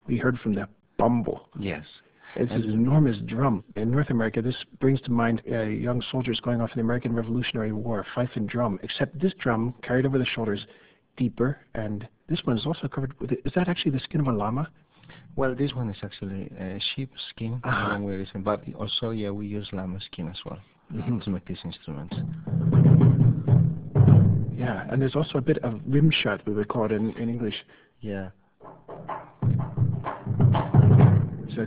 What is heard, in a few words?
bombo with discussion